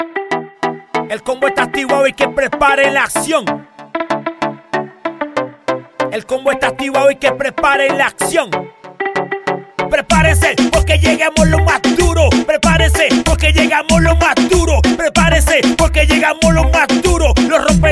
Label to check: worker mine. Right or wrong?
Wrong